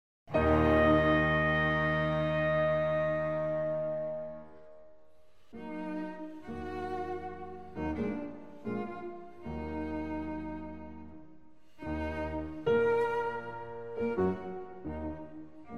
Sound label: Trio in E-flat Major, Andante con moto, presto; Ludwig Van Beethoven